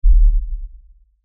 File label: LFE Ultra LFE Hit, Lfe Ultra Low Bass Hit Impact; Deep Tone; Sound Design